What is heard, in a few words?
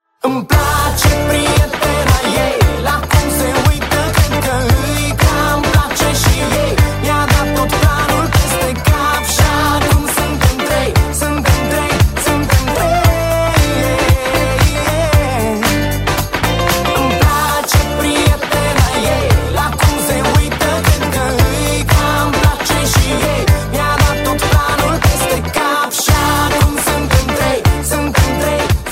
romanian ringtone